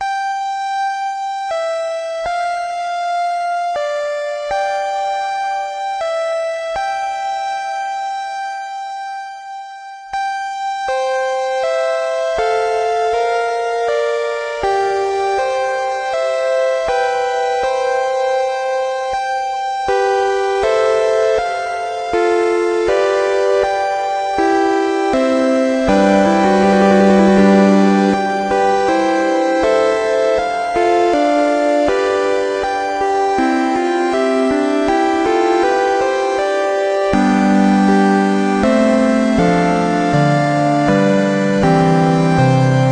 Electronica 8-bit; A Grounde

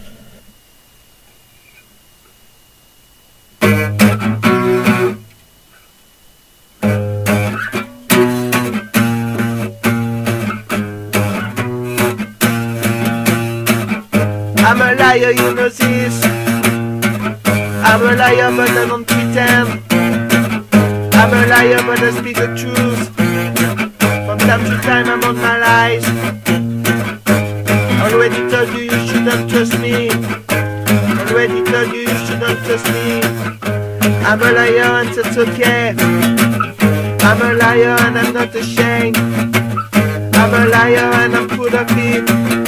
Acoustic Session

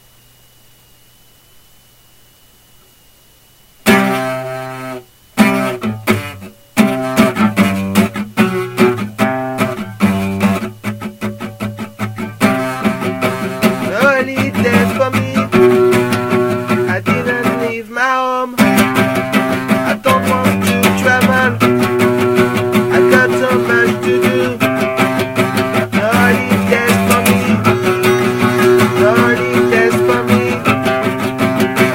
Acoustic Session

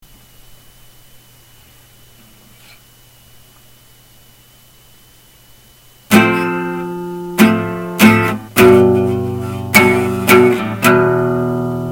Acoustic Session

